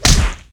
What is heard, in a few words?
Weapon Punch Critical